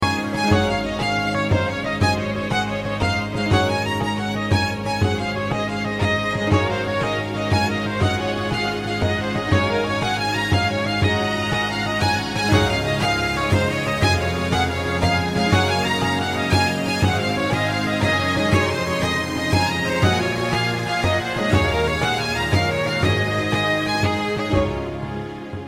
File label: violin solo; legend of zelda the wind waker